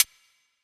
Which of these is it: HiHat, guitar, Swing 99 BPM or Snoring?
HiHat